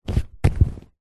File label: household chair sofa couch leather body limb down press against creak; zapsplat